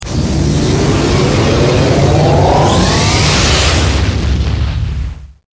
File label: WARP